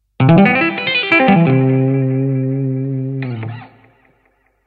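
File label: Lick, MODERN TAPPING; guitar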